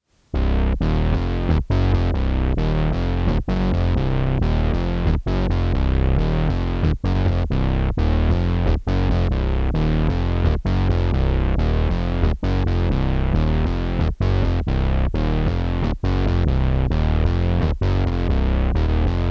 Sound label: Piece Reface CS Bass